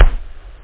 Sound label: dry kik, perc, kick; OctaMED SoundStudio V1; jazzies samples